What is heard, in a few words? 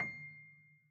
piano sampler